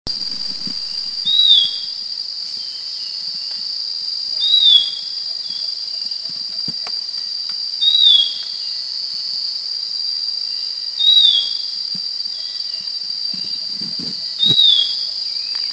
Puff-throated Babbler, Thailand

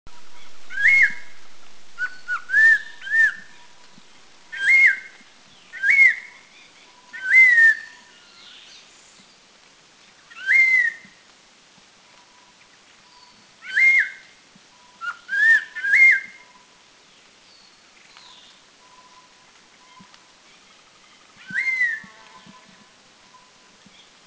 Rusty Laughingthrush, Taiwan; Garrulax poecilorhynchus Country endemic; Bedongyueshan; 3 sightings, always paired, Anmashan, Bedongyueshan and Yushan

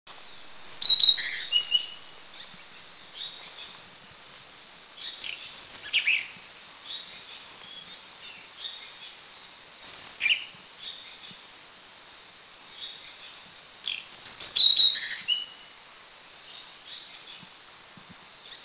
Tickells Blue Flycatcher; Mishmi; India

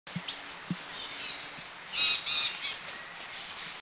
White-bellied Yuhina; Kaeng Krachan National Park; Thailand

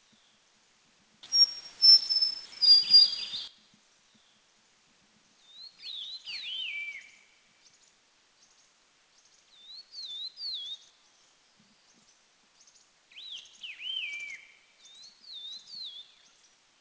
Large-billed Leaf Warbler, Dirang, India

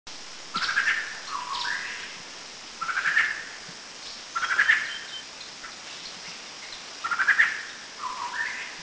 Collared Babbler, Thailand; Kaeng Krachan

